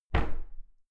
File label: cupboard close hard subdued